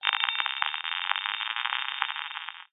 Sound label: technology cb radio interference burst receiver